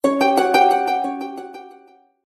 Ding dang; Lenovo A319

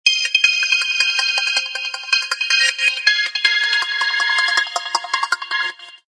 Sci Synth, Ringtone; HTC Freestyle

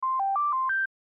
Missed Call Alert; LG GB255g; Event sounds

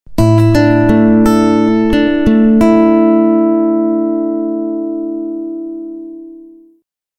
boot audio

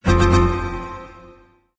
battery low power off; Samsung SCH-i539

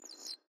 camera focus, ui; Razer Phone

